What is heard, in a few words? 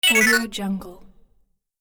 cartoon super thin arp down